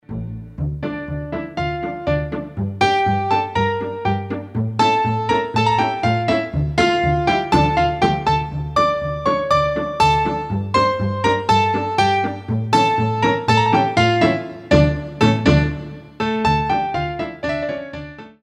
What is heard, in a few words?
Pirouette, Ballet